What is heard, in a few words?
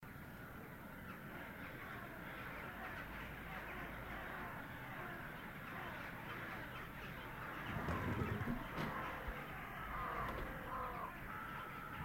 AMB Lonely Manor Terrace